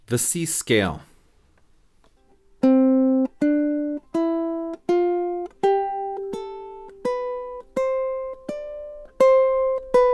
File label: The C Scale, ukulele